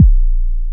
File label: fat bass drum single shot a sharp key